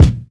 raw kick sample a sharp key, Royality free kick single hit tuned to the A# note. Loudest frequency: 258Hz; kick drum samples